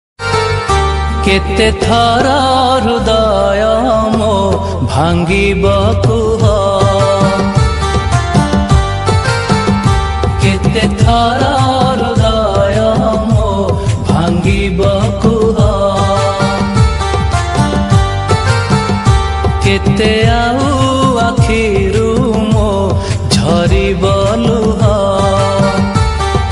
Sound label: sad odia status